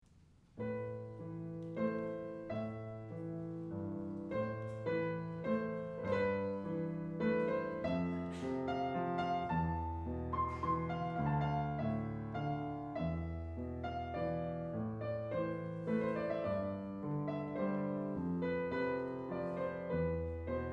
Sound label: waltz